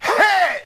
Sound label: "heh"; Voice; ooh ahh